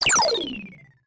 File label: beam gun